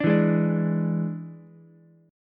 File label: E 7th E down Ukulele; E7 strummed; JGuitar